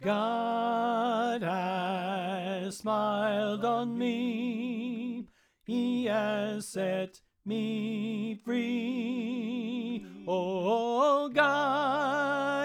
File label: Soprano Left